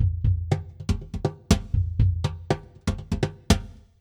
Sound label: Cajon C; Джазовый ударный сэмпл; Jazz Sample; 120 BPM; Drums; loop; Барабаны